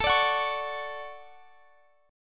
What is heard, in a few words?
D Major 7th, chord